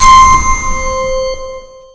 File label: killsound; tf2